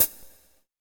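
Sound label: hi-hat, drums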